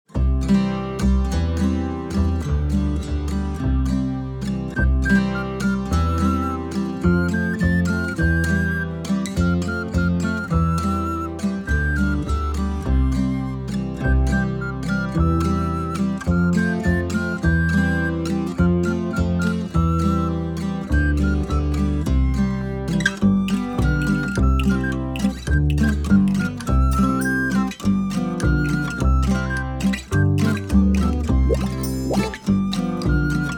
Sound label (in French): Version instrumentale; Studio les Trois Becs